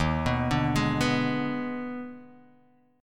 Eb Major 7th Suspended 4th Sharp 5th Eb down Guitar Drop D